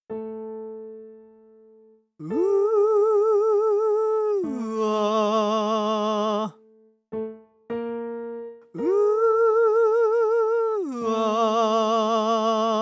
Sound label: practice mix voice